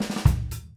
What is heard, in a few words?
FILL